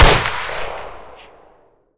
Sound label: aug, weapons; auga3